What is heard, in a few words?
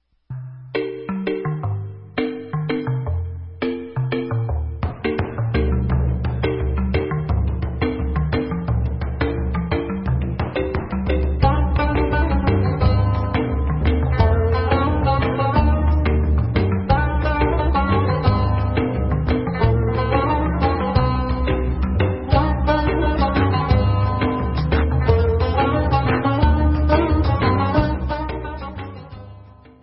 Debka, Instrumental; Debka Ayla